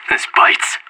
scared emote; anarchist

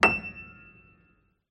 piano e7

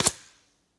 bullet by supersonic tail; weapons; nearmiss; Counter Strike Model Pack; CS2

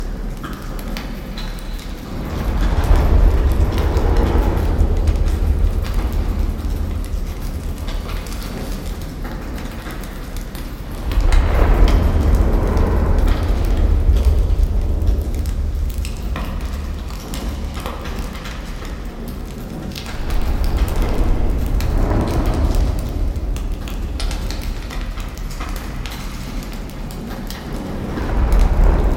apocalypse, ambient

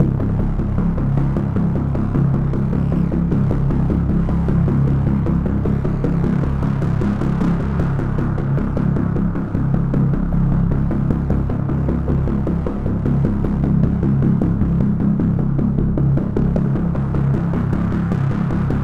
garage elevator, music

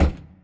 bank doors arrive, doors

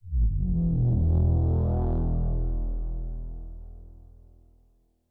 bass; music

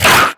attack grunt, headcrab, creatures; headcrab classic; vox

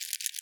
rodent scratch short; animal

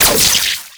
orb bolt

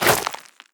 scuff, footsteps player debris

